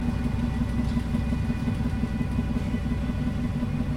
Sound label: machine hum